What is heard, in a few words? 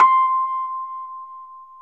Rhodes 73 Slow, C; E-MU Producer Series Vol. 5 – 3-D Audio Collection; 3DRhodes